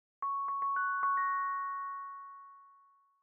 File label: call invitation; mail; odoo